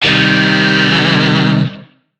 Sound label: Shawzin Min Chord A; Warframe; Lizzie Shawzin